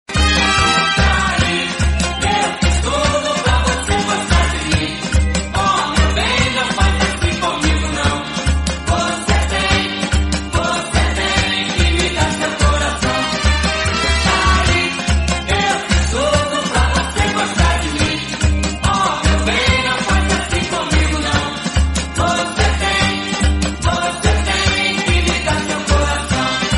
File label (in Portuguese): Carnavalescas